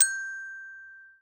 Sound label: gloken